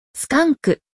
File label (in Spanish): zorrilo; Relaciona Palabras en Ingles